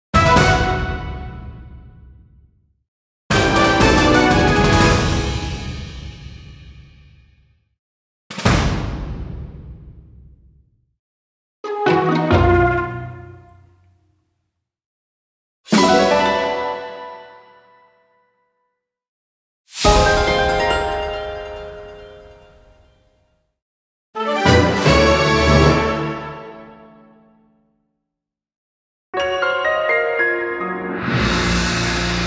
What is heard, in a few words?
Jingle Collection; Fire Emblem Engage